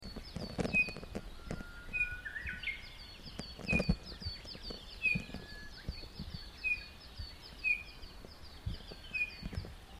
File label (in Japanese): ooruri uso; mane